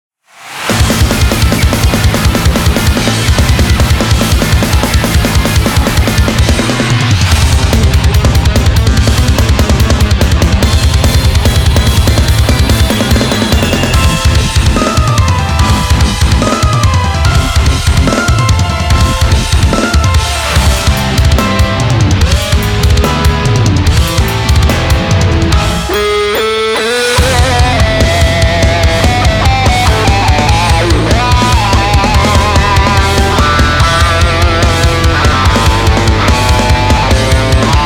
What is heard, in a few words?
instrumental